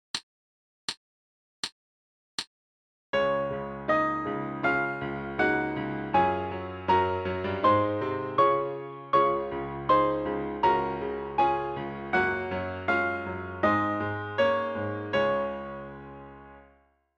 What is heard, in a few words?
D flat Concert Quarter Notes